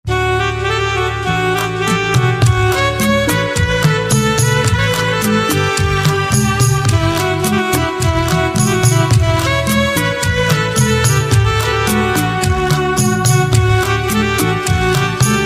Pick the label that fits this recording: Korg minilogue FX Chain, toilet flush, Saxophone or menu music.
Saxophone